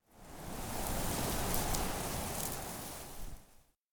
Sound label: soft wind; anemoi